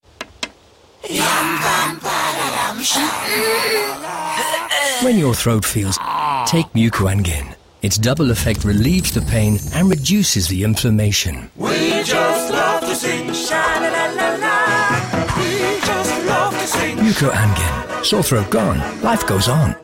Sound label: Choir; Mucoangin